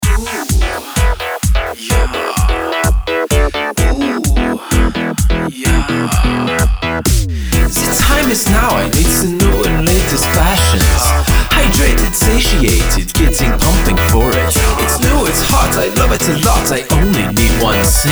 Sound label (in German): das horn